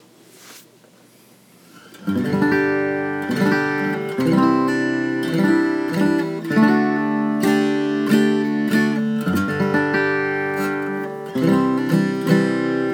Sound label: klepper chords; The first hour after tuning it up